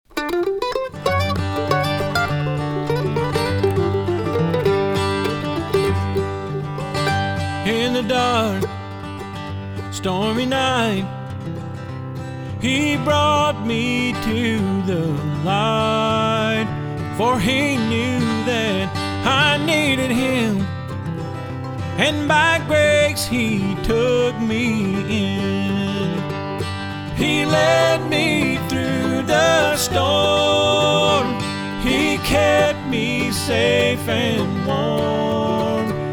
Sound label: bluegrass, gospel